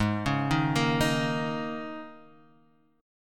G sharp Suspended 2nd Suspended 4th, G sharp, down, Guitar, Half Step Down